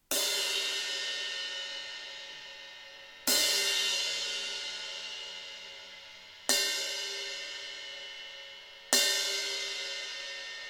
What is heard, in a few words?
Crash Rock 16; Amedia; Classic